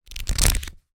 paper notepad pages flick through; foley; zapsplat

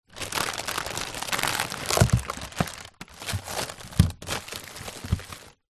household freezer items movement; zapsplat

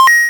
leisure video game retro 8bit coin pickup collect

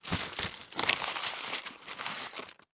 foley money in envelope tip out into hand

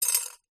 household jewelry necklace metal down on glass; zapsplat

